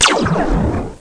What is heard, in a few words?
Laser shot; Let's Discover Michigan!